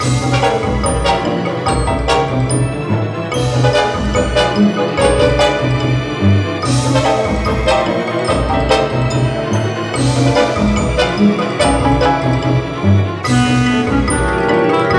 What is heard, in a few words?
Winter Fight 145bpm; Don't Starve Giant Edition; PS Vita